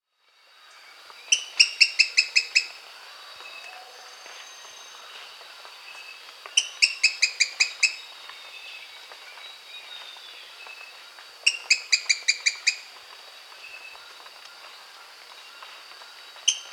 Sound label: Long-crested Pygmy-Tyrant (Lophotriccus eulophotes), Bolivia; S. America